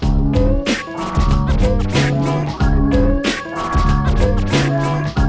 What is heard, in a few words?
94BPM, Hip Hop; InVision Interactive; 40 Oz Phat Slammin